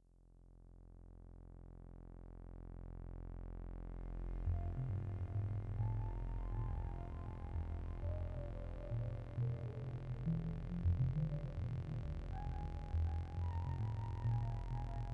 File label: Sequential Prophet T8 Int Sequence; pure analog